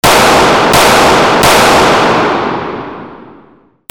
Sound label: WEAPONS